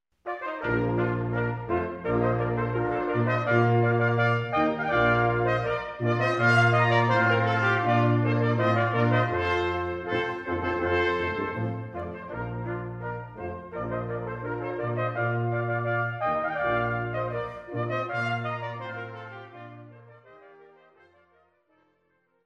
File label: Rondeau, brass